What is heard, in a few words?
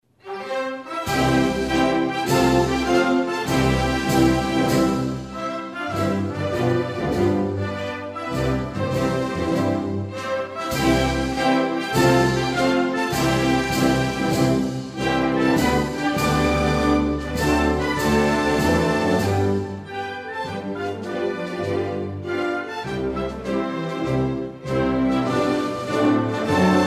phleng chat thai instrumental